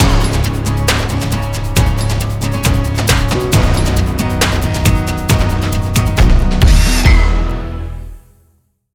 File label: Template 136bpm; Wuerth